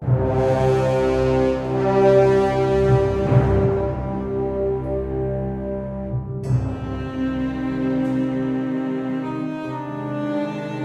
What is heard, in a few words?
Bridges2 stinger1